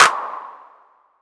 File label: hand clap sound d sharp key, clap sound clip tuned to the D# note. Loudest frequency: 2617Hz; single hits one shots